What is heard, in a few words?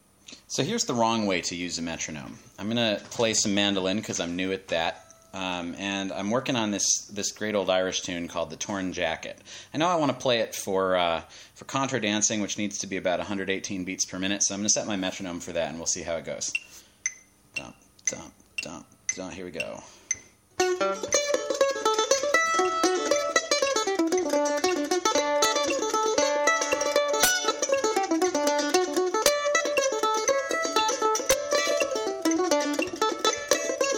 Metronome Bad